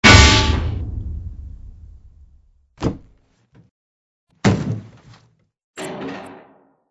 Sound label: drop safe